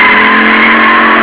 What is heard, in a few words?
peel short rubber